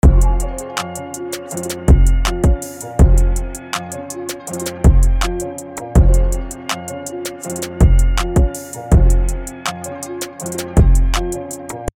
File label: Trap Melody Loop Gm 162 BPM